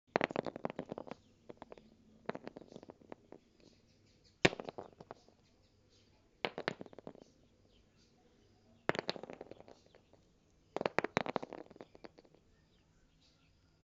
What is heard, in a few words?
Small Rock Falling And Rolling